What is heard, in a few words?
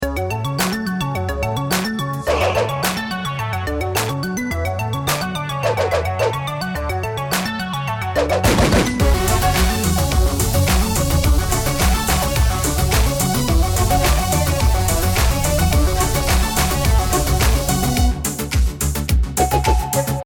start round